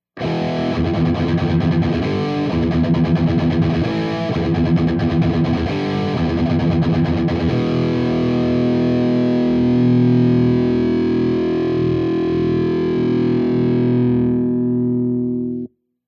ENGL bridge

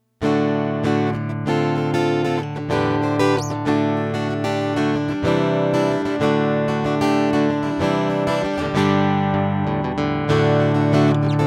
Atlantis strum

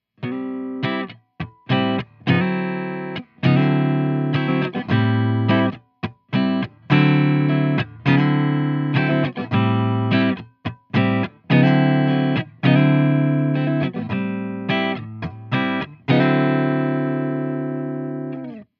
neck Humbucker; ENGL

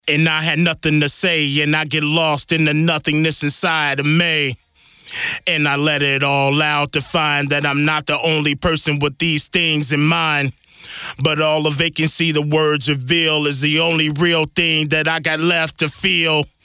Vocal Strip On

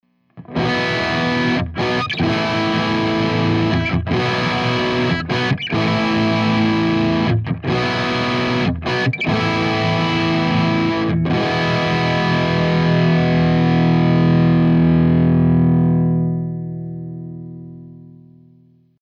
bridge Hum Hi Gain; ENGL

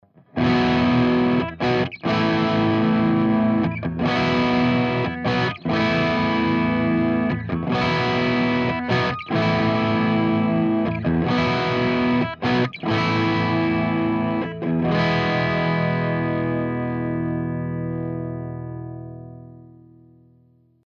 bridge Hum Lo Gain; ENGL

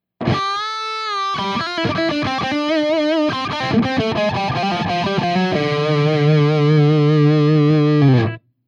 bridge lead; ENGL

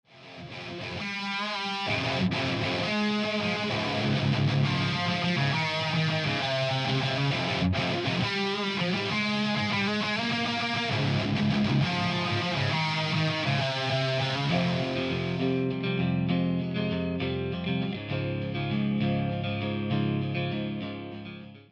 GTR Channel Strip Off

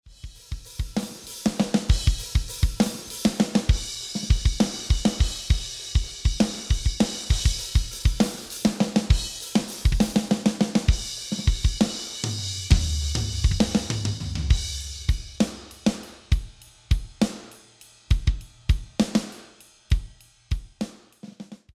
Drum Buss Comp Off

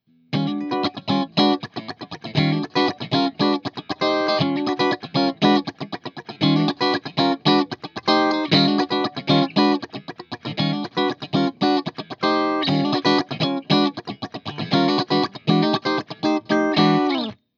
ENGL neck and bridge split

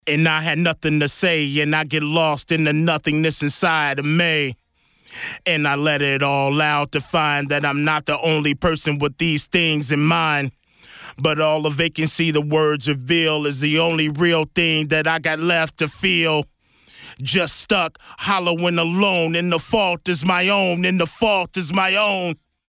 Vocal Strip Off

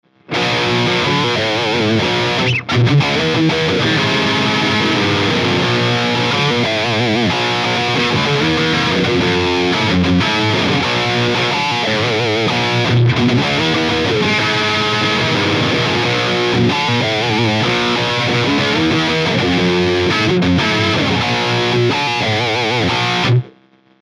Premium Heavy, ibanez s series guitars; s6570q prestige

